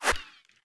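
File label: ricochet, weapons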